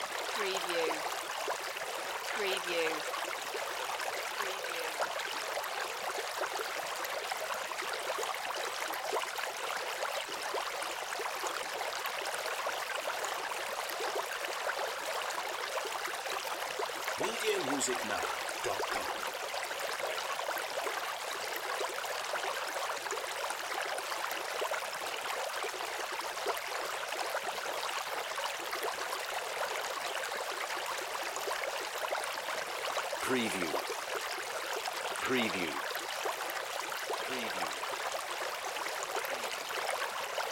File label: Creek Large